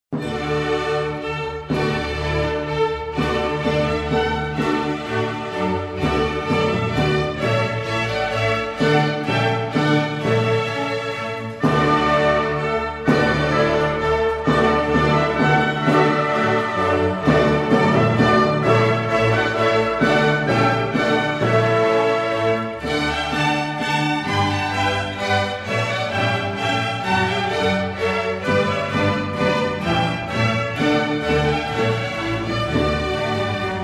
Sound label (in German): Menuett aus der Feuerwerksmusik